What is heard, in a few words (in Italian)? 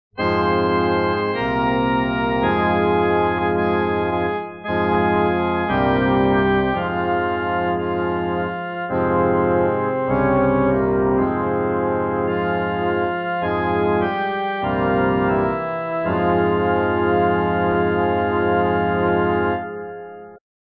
solo musica; ritornelli